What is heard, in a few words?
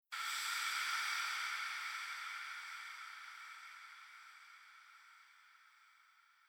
Future Bass FX Hit